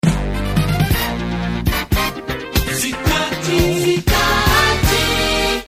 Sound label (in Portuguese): Vinheta; Cidade FM